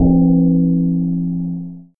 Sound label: clang